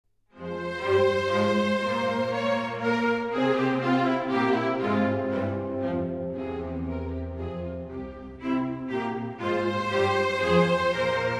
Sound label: Symphony in F minor; Haydn